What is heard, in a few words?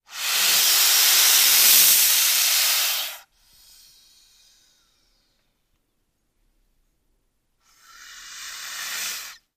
Boa, Albino Hisses, Multiple. Close Perspective.; snakes